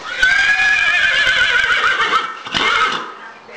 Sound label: whinny